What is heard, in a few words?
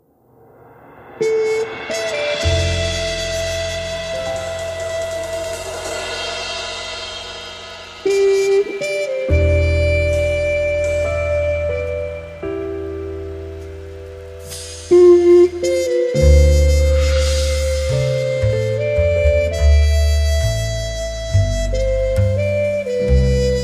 BLUE BALLAD